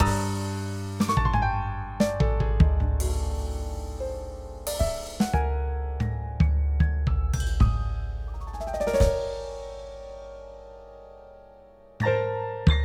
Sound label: piano